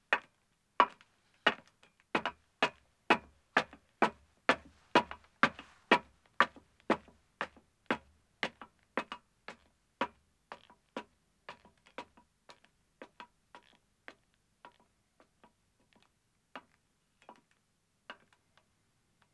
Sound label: Metal Stairs Ascending, 1 Man, Footsteps; BBC Sound Effects Library; Footsteps II